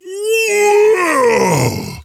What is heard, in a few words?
Grunt Attack